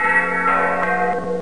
Complete Top Lane; Crystal Caliburn™ DEMO

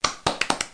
applause

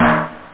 raggae, drums, snare

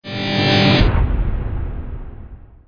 Connect

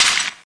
mtl db, debris metal; SiN

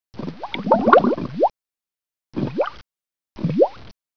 bubble

